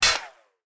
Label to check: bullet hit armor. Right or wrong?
Right